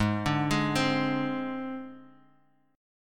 G sharp Major Flat 5th, Guitar, Half Step Down

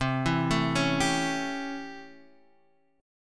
C 6th Flat 9th chord; C6-9 arpeggiated

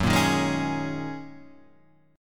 D sharp Minor 11th F sharp, chord

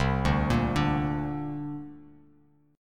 C 7th Suspended 2nd, C, down, Bass Standard; arpeggiated; chord; C7sus2

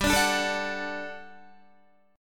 G Major 7th Suspended 2nd, Guitar; strummed; 4F,4A,5C,5G,5C,6E

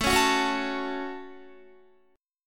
A 6th, Guitar, chord; 17,16,16,18,18,16; 4E,4A,5D,5G,5C,6F; strummed

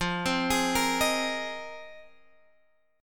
F Minor 11th, Guitar Open G

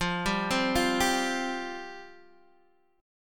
F Suspended 2nd, Guitar Open D; x,8,5,6,8,5

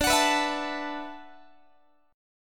D 6th Add 9th D, Guitar; D6add9 strummed; x,22,21,21,20,20; JGuitar

